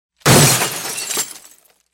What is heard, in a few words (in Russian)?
zvuk bjushhegosja stekljannogo jashhika